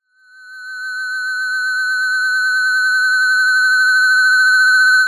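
sound design increasing high pitched tone short shock tinnitus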